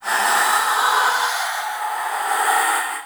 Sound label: Minion Vocal; Warframe; Stalker Acolyte Minion